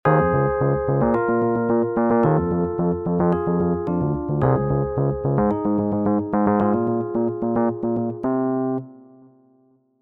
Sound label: chords n bass